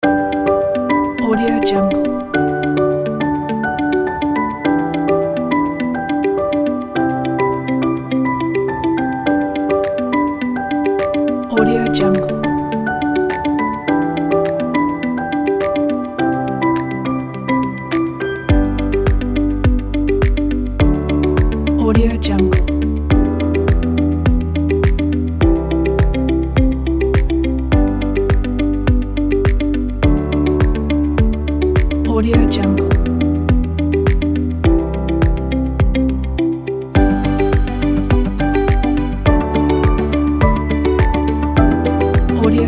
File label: ambient music